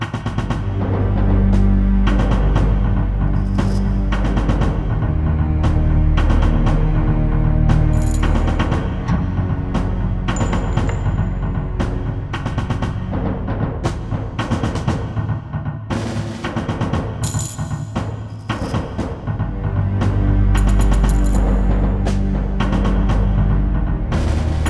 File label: Suspense; Stronghold